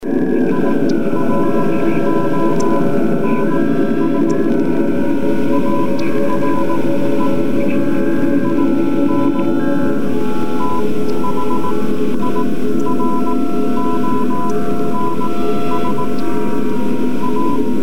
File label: morse code